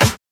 natural steel snare drum sample g sharp key; Duration 0:01

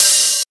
mellow open high hat b key

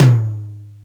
mid tom sound b key

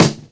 raw snare sound D key; snare drum samples

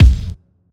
present kick single hit c key; Duration 0:01

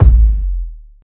rich low end bass drum single shot a key; kick

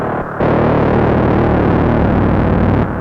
monodelay b 87, synth; loop

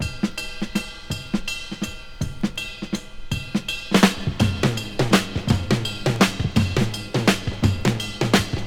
111 bpm breakbeat g sharp key; drum loops

